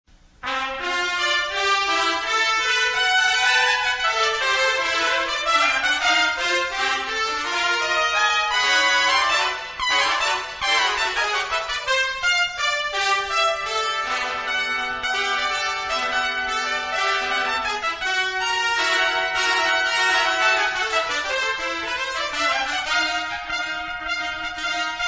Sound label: tpt fanfare